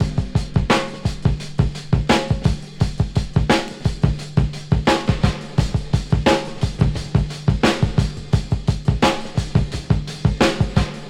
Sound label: 86 bpm breakbeat D key